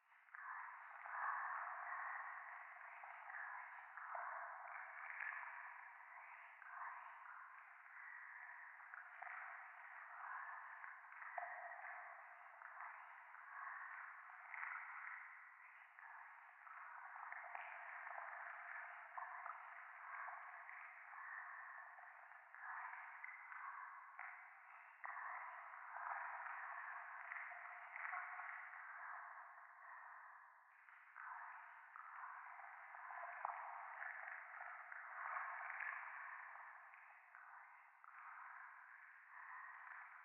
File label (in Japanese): 廃墟 しこしこ 触手